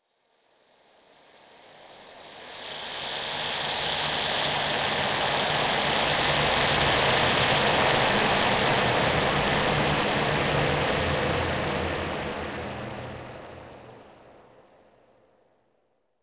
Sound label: Electrical Storm